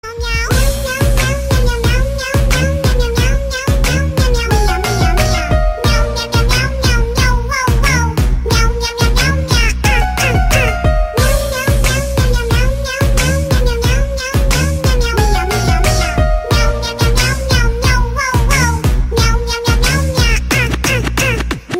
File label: Bongo Cat